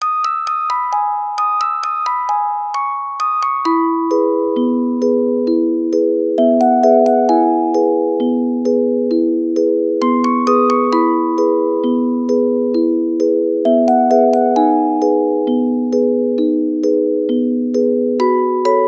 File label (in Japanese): onpro orgel